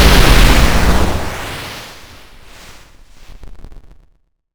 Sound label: Retro Falling Water